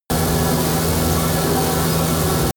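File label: no signal, science fiction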